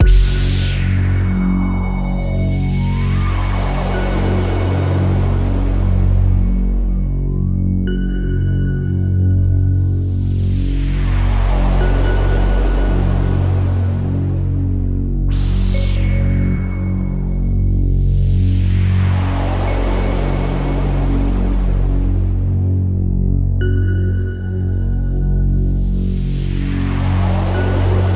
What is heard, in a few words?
Radio Toolkit Pad